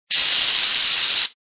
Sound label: fuse loop; Super Mario 63